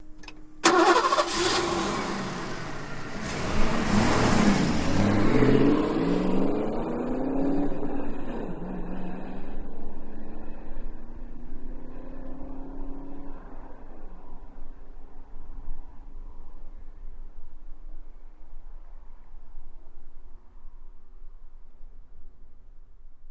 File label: Mustang Start, Away Fast, With Shifts and Ignition Click